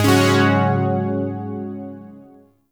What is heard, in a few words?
Shawzin Yo Chord G; Chords; Warframe; Zariman